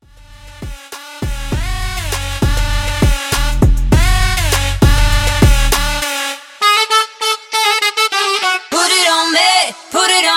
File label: Vocal Bass 100Bpm